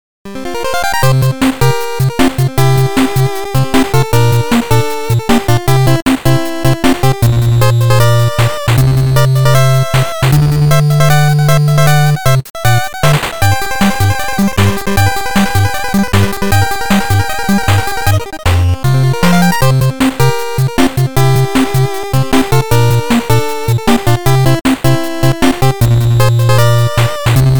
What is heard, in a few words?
VICTORY; 7th Dragon; PSG